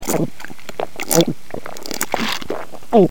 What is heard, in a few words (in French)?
Glups